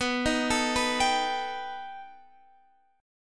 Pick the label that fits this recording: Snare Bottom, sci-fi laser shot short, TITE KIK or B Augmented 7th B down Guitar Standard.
B Augmented 7th B down Guitar Standard